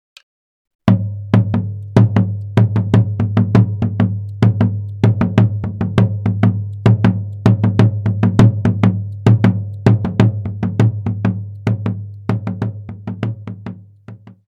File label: alfaia barravento